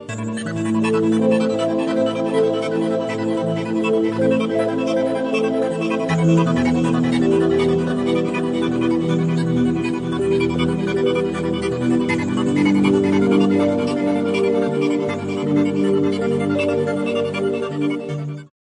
cosmos echo panning wet

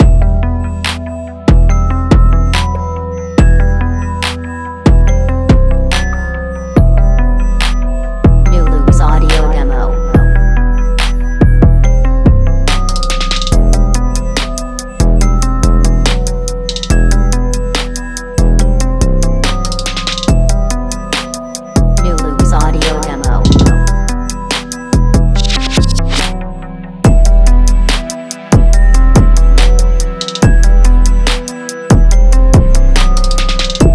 808s

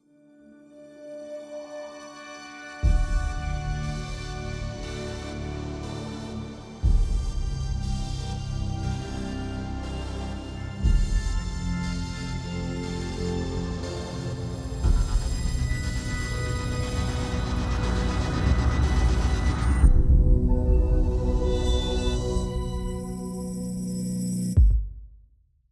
candyfloss cinematic trailer

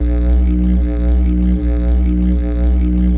didgeridoo hoover loop

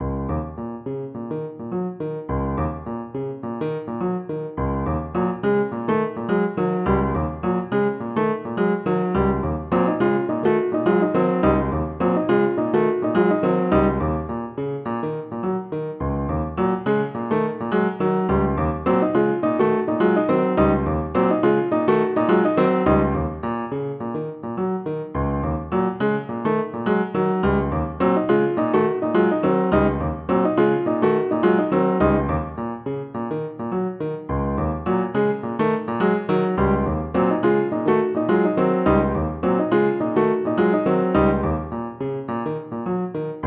riff

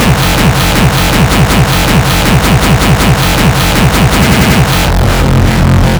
kickdrum 160 bpm; distocore

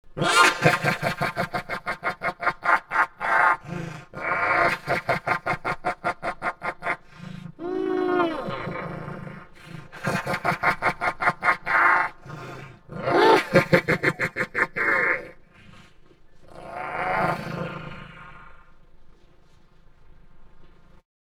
laugh tubed crazy

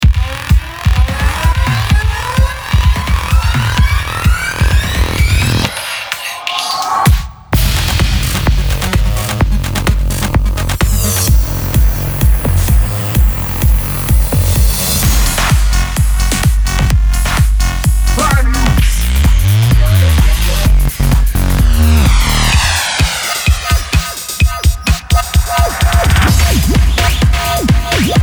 mainroom drums

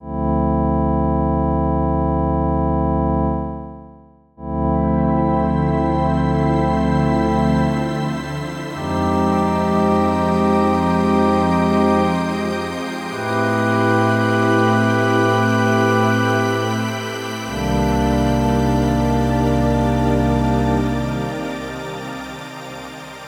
synth pad; luminance

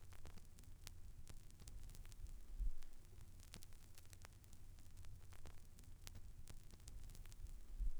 vinyl

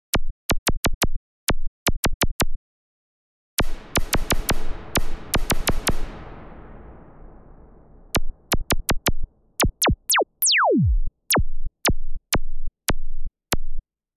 filter zap; opx pro ii

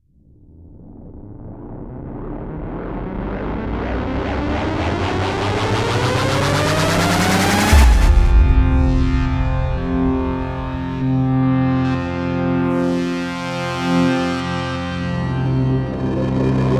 modulation routes per lfo

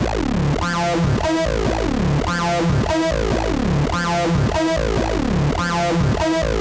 bass

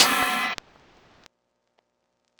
60s ride hat

